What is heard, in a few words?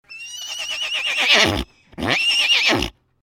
horse sound